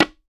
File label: Tabla Baya Te; Roland BK-5; Percussion